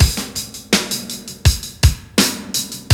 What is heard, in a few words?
break; ZERO-G; Datafile